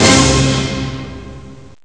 orch hit

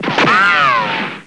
bullet; alienbreed3d2

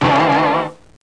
Wrng Pce; Bible Fun Pack; Education Publishing Concepts; NOAH

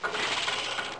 machines

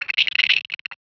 idle, beetle; Heretic II

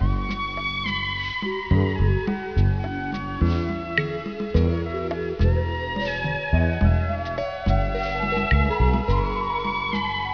Muzak; WARLOCKSNDS

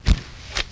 MARCH

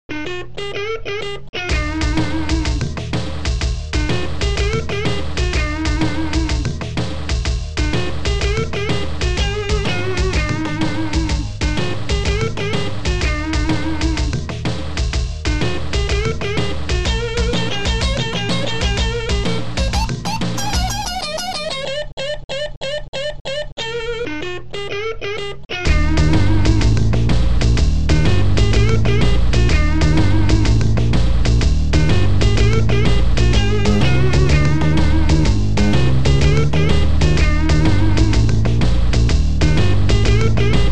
Short Rock

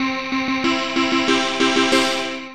finish music

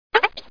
flip; Bible Fun Pack; Education Publishing Concepts

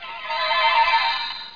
Magic tree

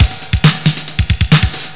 drumbeat; musical

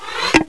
Foosh; Smile!

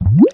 bubble fall bonus; Geschicklichkeit; AquaBubble2

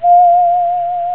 Whistling; If Monks had Macs; MacCube Volume 5: Home Applications

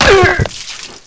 Big Impact

